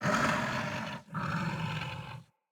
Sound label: Kubrow Feral Vocal Breath Walk